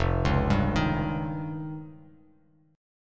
F 7th Suspended 2nd Sharp 5th F Bass Standard; F7sus2#5 arpeggiated

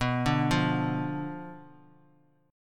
B Diminished B down Bass Standard; Bdim arpeggiated

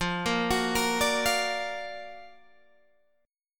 F sharp Augmented Major 7th F

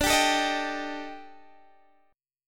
Eb Major 7th Flat 5th D, guitar

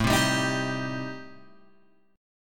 A-Minor 7th Flat 5th, Guitar Open G, chord

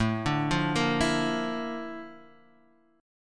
A sharp Major Sharp 11th

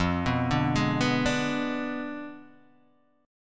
F Diminished 7th, Guitar, DADGAD; 3,2,0,1,2,0

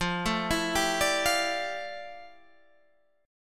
F Major 13th Guitar Standard; FM13 arpeggiated

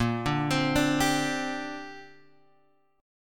A sharp 6th Add 9th, Guitar Open G

